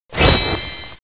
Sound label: bling; creep xmasitall